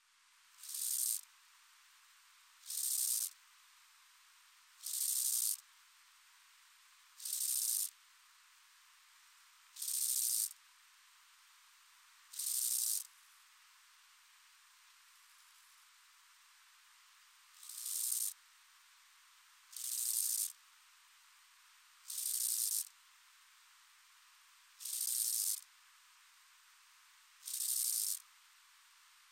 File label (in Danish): syngende markgræshoppe